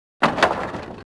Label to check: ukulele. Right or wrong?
Wrong